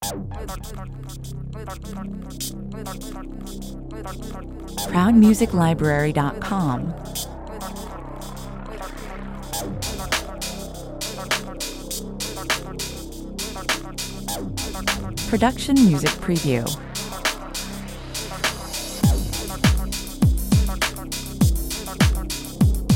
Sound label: electrobells 125 bpm